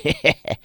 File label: Laugh; Harvest Massive Encounter